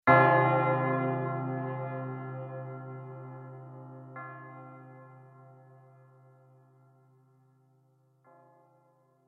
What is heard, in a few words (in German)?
glocke ton massage